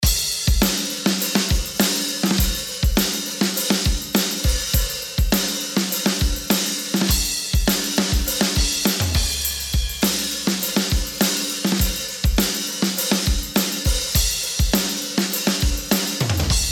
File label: drums bus before